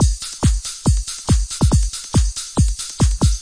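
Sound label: 140 bpm, electro kick 4-4 techno, drum loops and breaks; 131 to 140 bpm; samples-ghost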